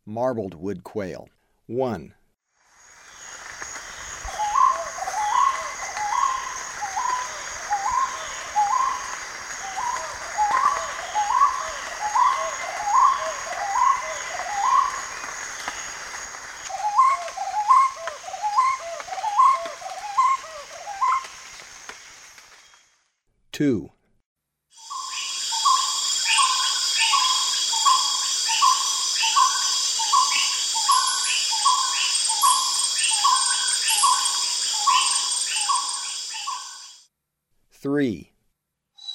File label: Marbled Wood Quail, Birds of Ecuador; S. America